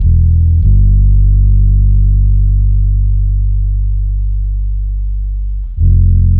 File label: three note finger bass; MELODIC SAMPLES